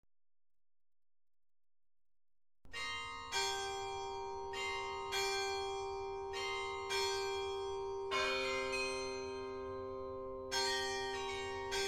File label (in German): Klima glocken; auto